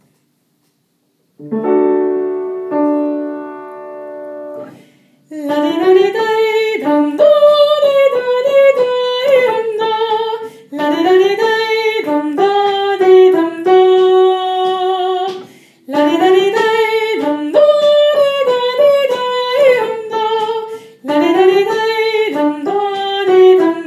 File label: Kom SOPRAN